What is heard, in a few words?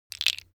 object table game tiles wood